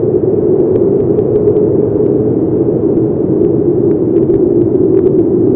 Empty Wind; Nightfall

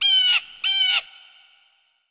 Desert bird; ImpGal2; colony

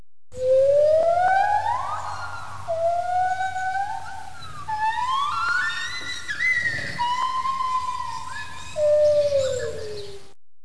Rain Forest Recycle; 250 Desktop Themes

